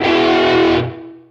Pickup Guitar Riff; SFX Kit; Pickups

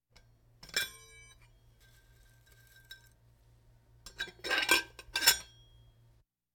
Hospital effects

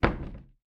closet close, household; NS Studios - ultra sound collection

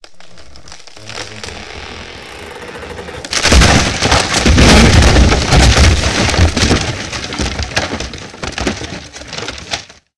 bridge dest; firefight

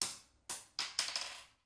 Bullet Shell; Sound Ideas; Guns

